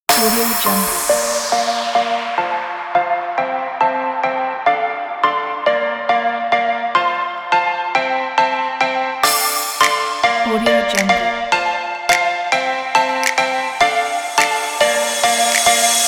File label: tropical house